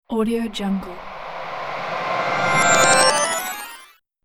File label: vision magic teleport